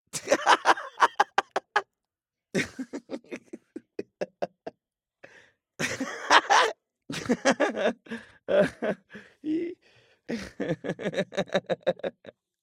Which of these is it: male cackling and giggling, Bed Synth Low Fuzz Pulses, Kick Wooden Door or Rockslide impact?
male cackling and giggling